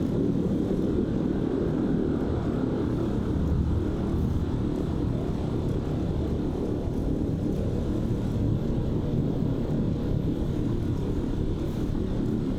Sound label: ship battleship idle; synthqueen; Stellaris; machineage